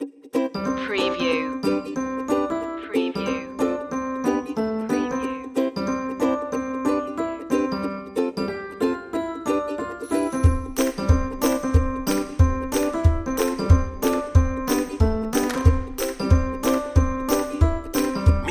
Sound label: reggae happy loop